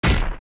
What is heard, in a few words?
Hookshot Target; Hookshot hitting a target